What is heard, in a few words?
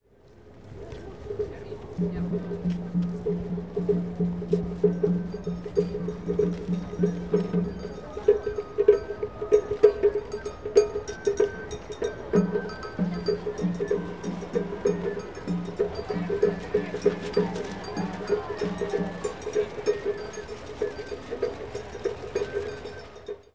Nihon Bashi